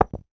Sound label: industrial large screw drop on carpeted floor